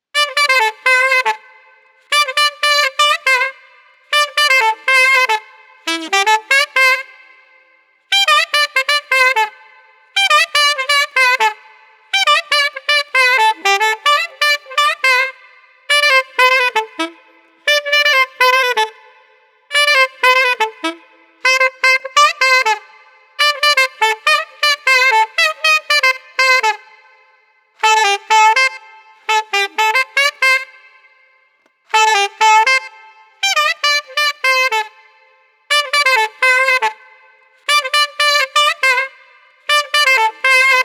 Street Sax Excited 120

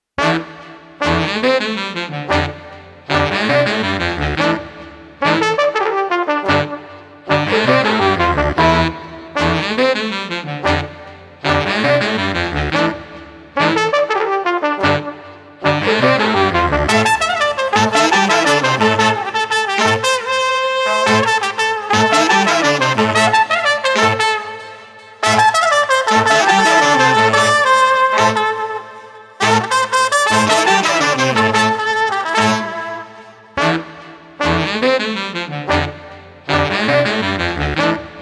Street Brass Funk Steady no Drums 115

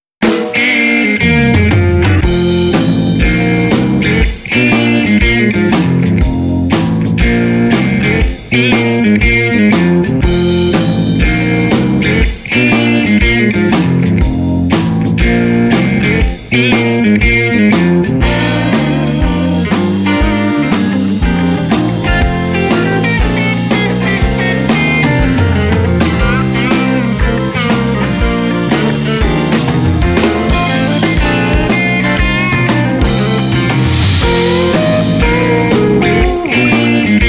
Blues Rock Upbeat Instr 120

